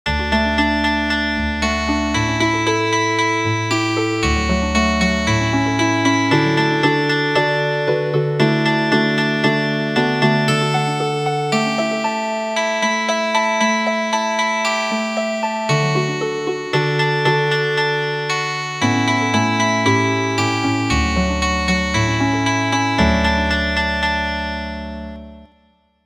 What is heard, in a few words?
Healing Harp